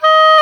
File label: OBOE, Orchestral Winds, Dry; Roland LCDP04; Oboe 4-8